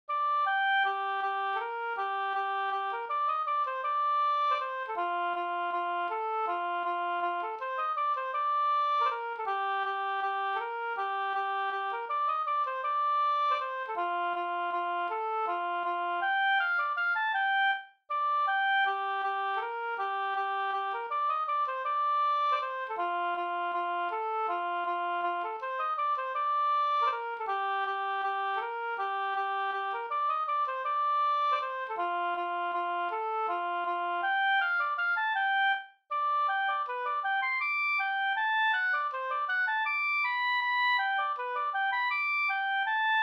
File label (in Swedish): vals Brudvals